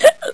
Hiccup

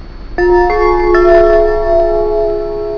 CHIME Bells

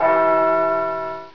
big bell

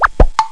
zip pop do

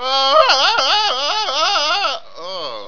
funny tarzan

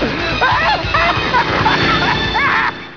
Laugh

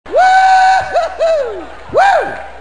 Woo Hoo Hoo Woo

